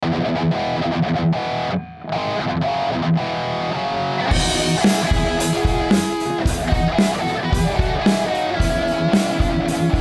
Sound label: Guitar